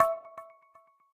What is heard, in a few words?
tick; chromium